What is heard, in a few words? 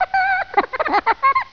Laughing Mice